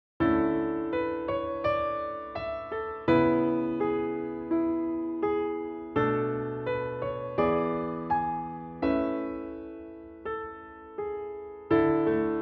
Piano

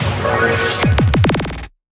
FILL, PsyTrance

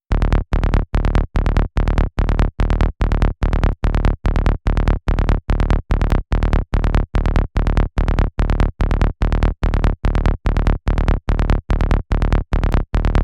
Bass Dark, PsyTrance; LiLA'c Records PsyTrance Sounds 01

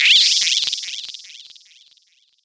Zap, PsyTrance

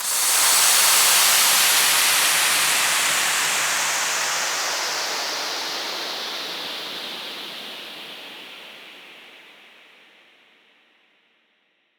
Down Lifter

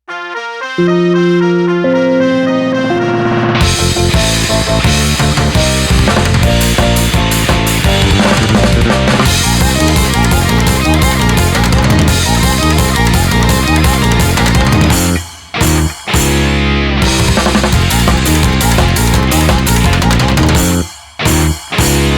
instrumental

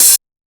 Open HH, PsyTrance; Cymbal

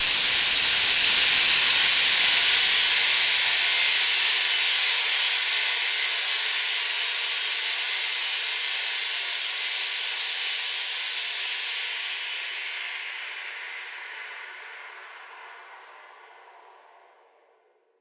Down Lifter

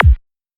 Kick; LiLA'c Records PsyTrance Sounds 01

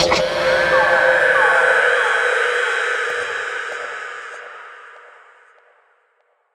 FX; LiLA'c Records PsyTrance Sounds 01

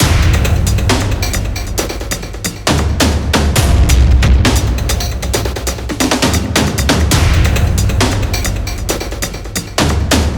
Epic Cinematic Orchestral; Epic Cinematic Orchestral Pack 2

